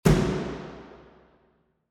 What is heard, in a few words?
Dhol